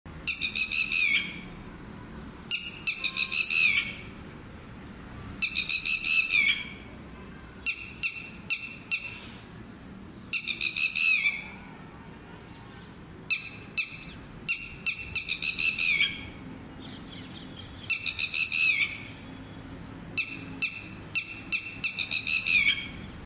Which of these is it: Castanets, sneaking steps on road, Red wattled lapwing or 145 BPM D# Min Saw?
Red wattled lapwing